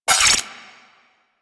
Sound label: Igniter Pistol Mech; Warframe; Weapons; Corpus